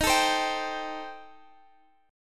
D sharp 6th Flat 5th, Guitar, Standard; strummed; D#6b5; x,x,13,14,13,15; JGuitar

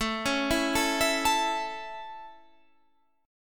A Major, Guitar, Standard; arpeggiated

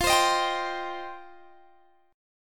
F 7th Suspended 2nd F Guitar DADGAD; F7sus2; x,20,22,20,22,22; strummed

